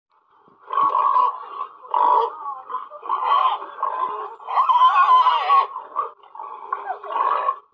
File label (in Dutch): everzwijn, animal sounds